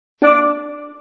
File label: Drum